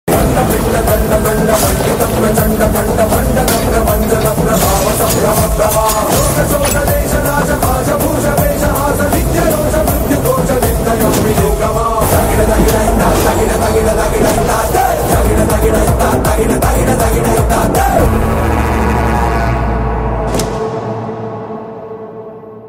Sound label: movie back ground music telugu movie bgm; skanda; ram pothineni